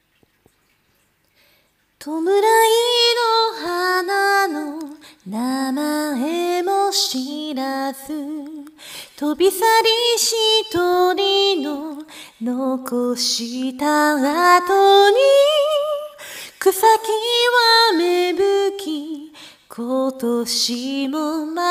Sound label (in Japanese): アカペラ